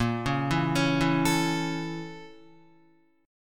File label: A sharp Minor Major 7th Flat 5th A sharp Guitar; A#mM7b5 arpeggiated; JGuitar